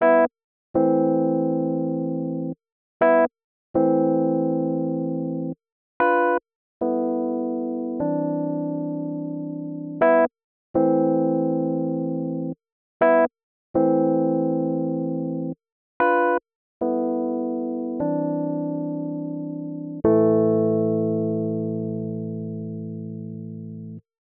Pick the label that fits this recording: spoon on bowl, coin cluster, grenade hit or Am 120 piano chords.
Am 120 piano chords